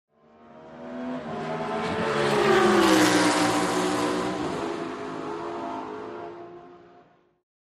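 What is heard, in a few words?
Sports Car Close Up By Fast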